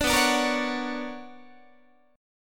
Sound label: B Minor Major 9th, Guitar; BmM9 strummed; JGuitar